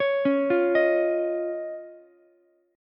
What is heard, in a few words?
C sharp Major Add 9th C sharp, Ukulele; arpeggiated; chord; JGuitar